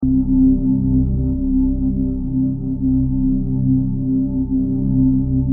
Mech Drone

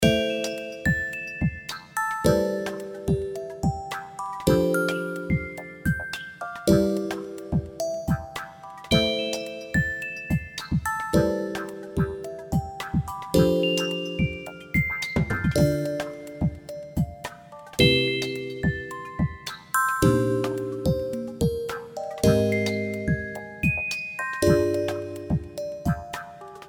Music Box Puzzles

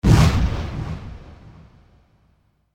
Explosion

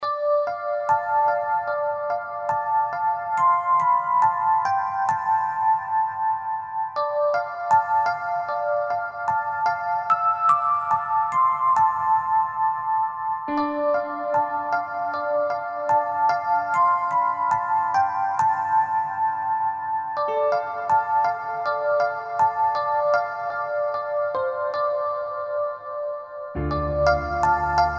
The Runaway Looping

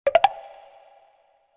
UI Quirky

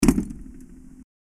Robot Footstep

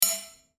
Clank

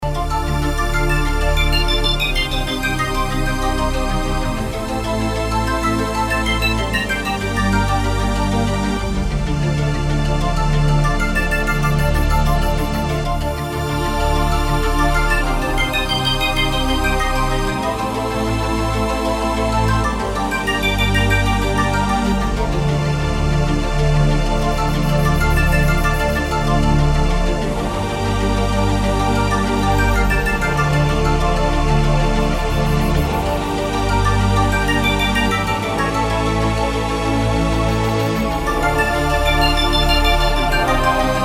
Ominous Technology

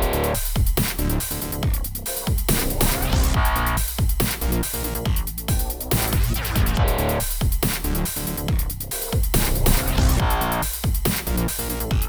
Dubstep Danger; funny

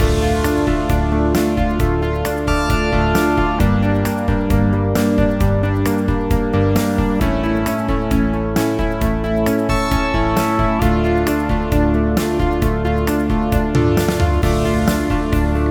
8 Bit Espionage, chiptunes

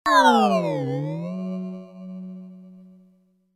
Power Down

